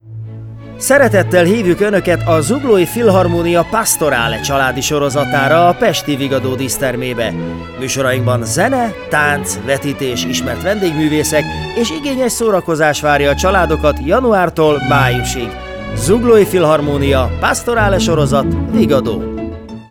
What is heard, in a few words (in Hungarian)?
pasorale